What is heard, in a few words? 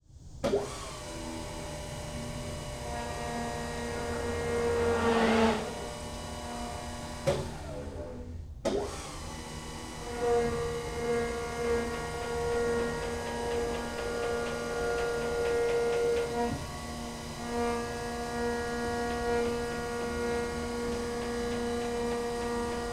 Chaffee M24 Light Tank hydraulic traverse; Pole Position; var sfx ext